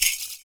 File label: FOOT PERC; Univers Sons - Raricussions